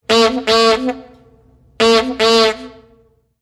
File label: old taxi horn; wake-up tones